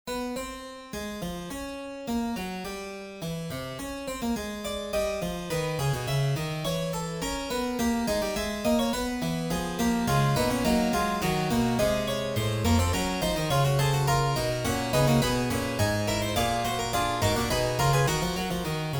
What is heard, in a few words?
Fugue